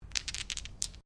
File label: Dice Medium Pair Shake Hands; Variety of dice sounds; Casinos